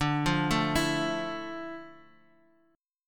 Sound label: D Minor Add 9th; Dmadd9; arpeggiated; JGuitar